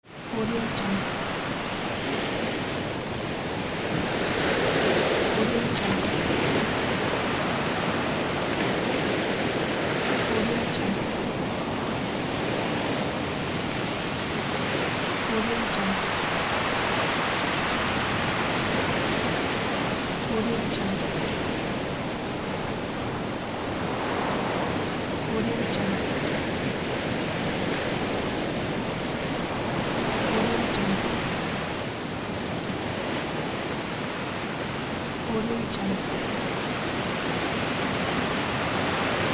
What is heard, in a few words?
ocean waves